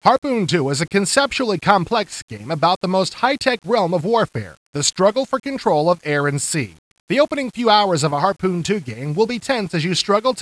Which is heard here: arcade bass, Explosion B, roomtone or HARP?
HARP